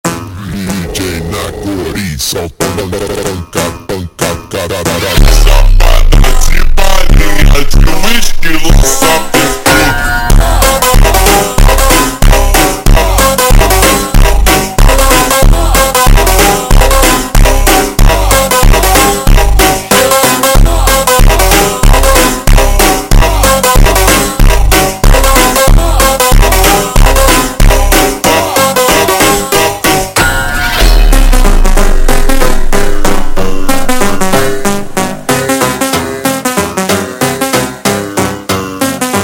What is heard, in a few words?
ultra Slowed, funk